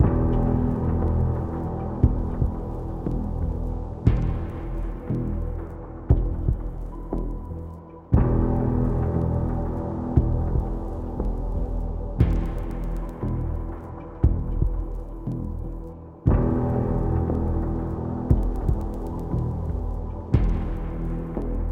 Loading Screen Music; Deus Ex Mankind Divided

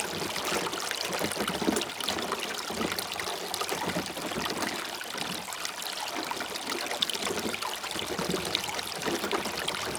environment water wheel

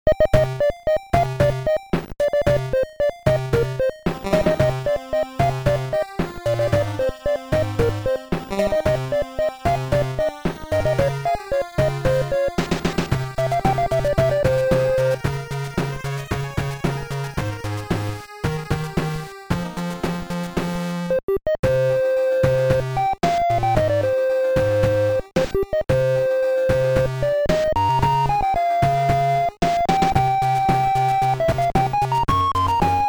Combat; just breed nes; Overworld

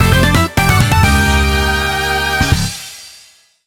Race Start Fanfare; Mario Kart 7; [Grand Prix & VS]

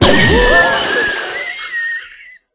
Flower death

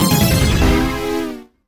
Failure Fanfare; Spectrobes: Beyond the Portals; Nintendo DS

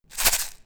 ultimate attack; nerds; Idle Champions of the Forgotten Realms

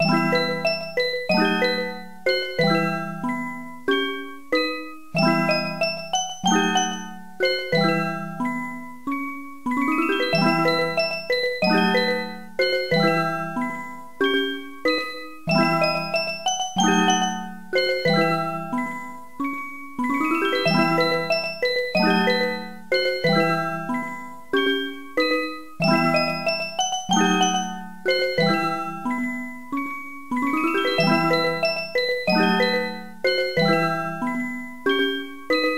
Organ Grinder; Touch Detective; Android; Hey, Lugol!

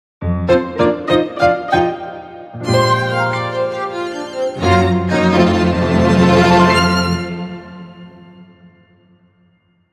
Skill Learning Jingle; bayonetta origins cereza and the lost demon; Umbran Dance Advance Steps

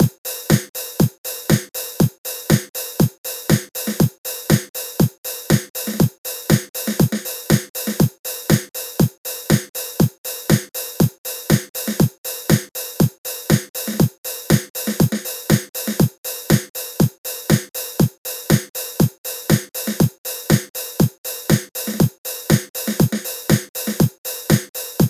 Frontrunning @ 120bpm; Mario Kart 7

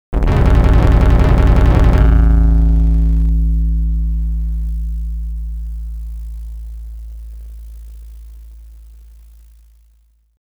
DOOR OPEN

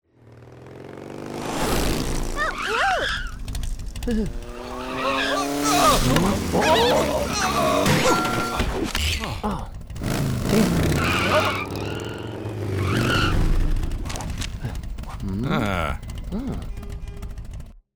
MotorBike Outro

